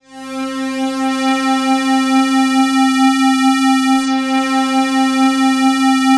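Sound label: PAD C4 left, Jungle; USB Soundscan vol.05 - Explosive Jungle; AKAI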